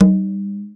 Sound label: Tumba; EMU Orbit-3